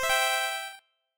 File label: hero chord